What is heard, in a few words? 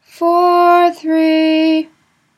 weekday minor pausal, nusach